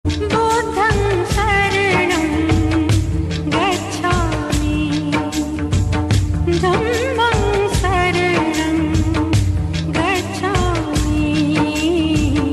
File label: buddham sharanam gachchhami hindi ringtone